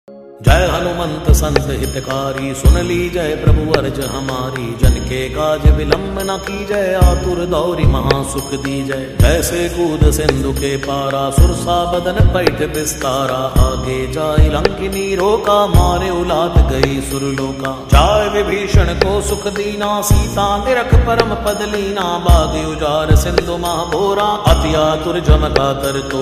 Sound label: bajrang baan lofi ringtone, bhakti ringtone; hanuman ringtone